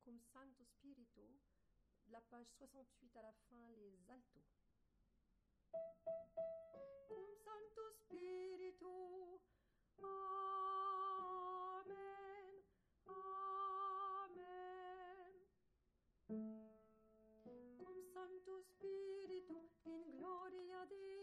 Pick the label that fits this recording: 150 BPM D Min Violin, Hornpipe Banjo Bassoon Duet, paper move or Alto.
Alto